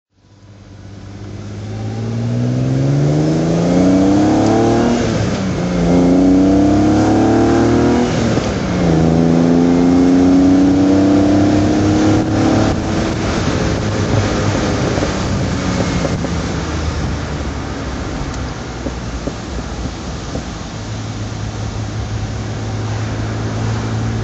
triumph tr6 pi drive

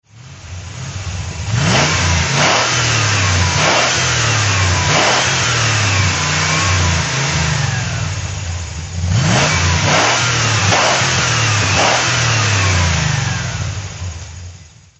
morgan plus8 throt

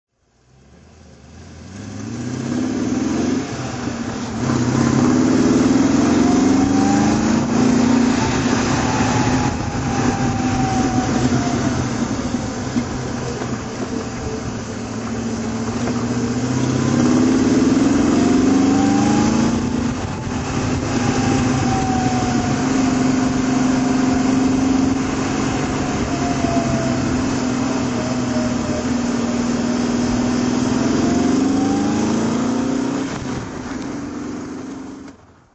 morgan plus 8 drive

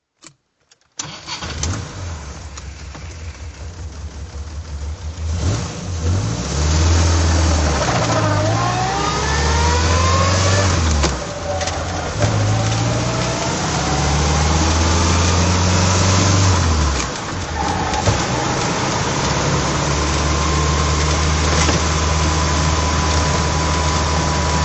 morgan dhc drive

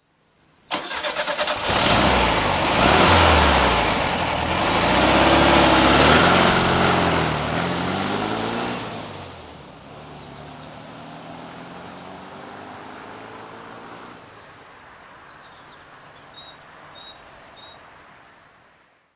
vw1303 driveoff

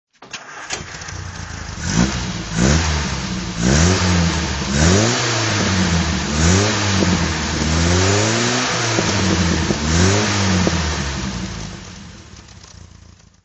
morgan ford throt

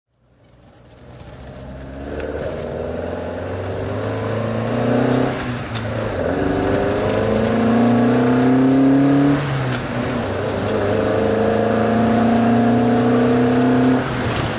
lotus elan sprint drive

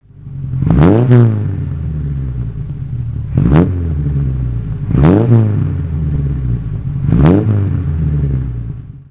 morgan

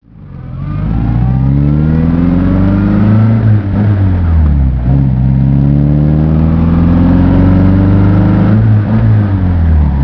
morgan drive

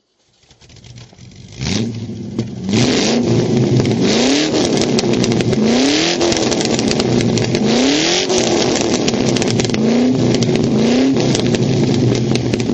morgan plus8 throt

